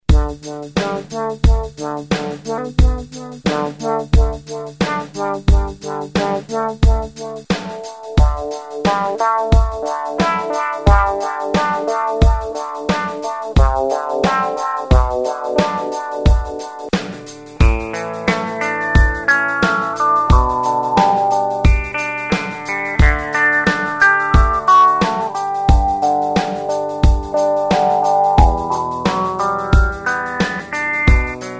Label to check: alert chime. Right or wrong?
Wrong